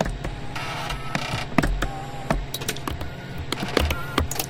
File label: Vending machine; Fabricators; DynamicEuropa